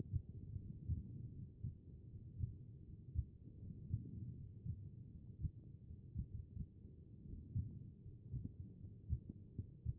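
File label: HEART, heart sound; B MODE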